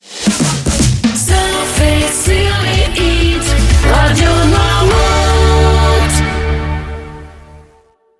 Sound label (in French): Jingle Chante; encore plus court; Radio Noirmout